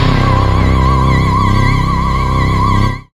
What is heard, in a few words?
Dark, Pads; Jungle Warfare 1&2